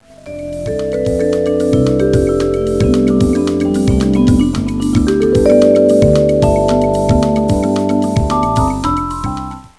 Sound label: arpeg dry